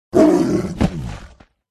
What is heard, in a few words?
panther death